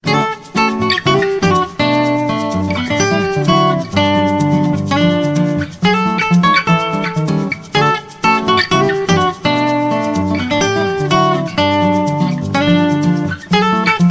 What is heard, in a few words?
guitar melody ringtone